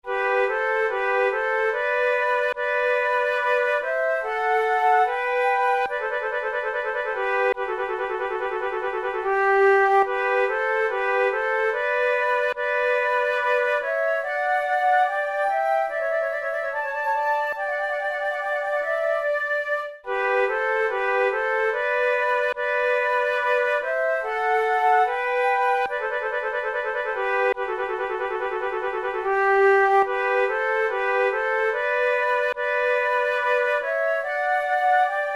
sarabande, flute

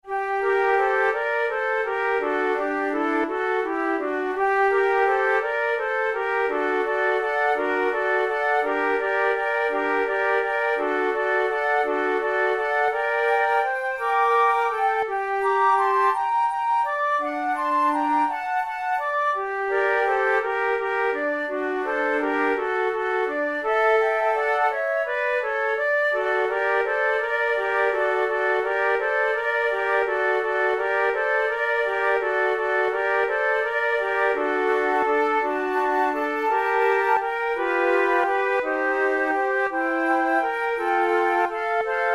flute trio largo; scherer

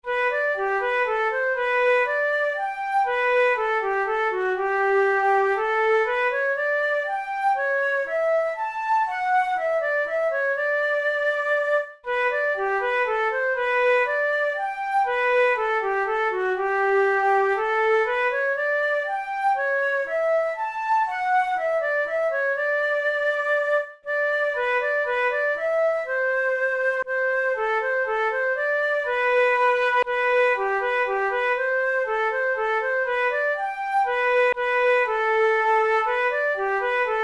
minuet

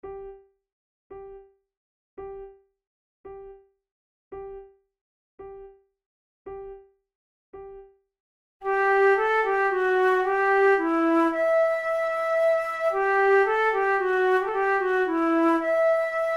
duet, flute tunes

